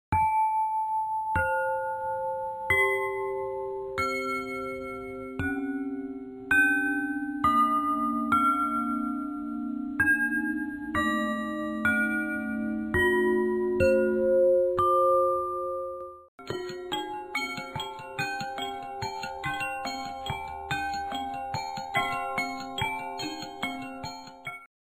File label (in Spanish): metalofono tubos; reciclar tocar